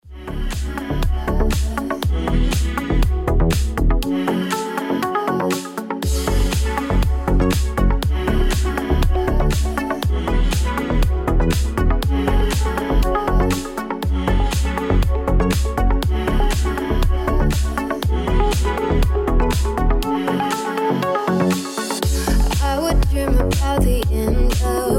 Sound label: Ringtone